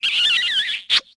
kiss; patricia

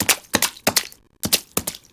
belly slap; orla

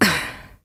ugh; darklaw

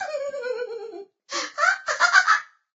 giggle

